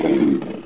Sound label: idle, monsters, screamer; AoMClassic